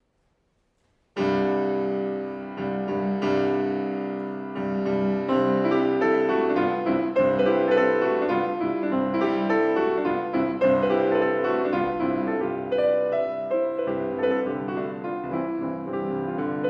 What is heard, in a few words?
F.Chopin Mazurka Op.56 No.2 in C Major piano